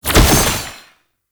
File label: Fist Claw Spike Despawn; Weapons; Warframe; Tenno; Incarnon